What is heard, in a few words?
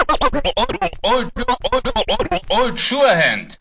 orange, Scratching and Turntablism; DRUM LOOPS and BREAKS